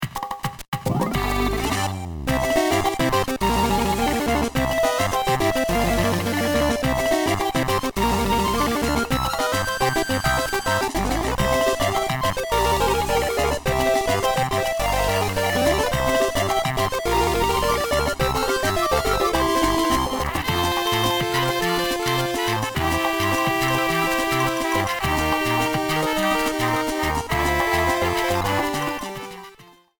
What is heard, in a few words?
Mini-Game Theme; MPA